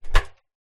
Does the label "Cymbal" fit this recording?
No